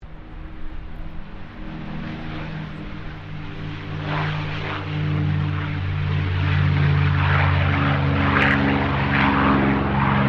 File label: AIRCRAFT CAC CA-18 MUSTANG MK23 TAKEOFF; CA-18 /P-51 Mustang; Airplanes; Vehicles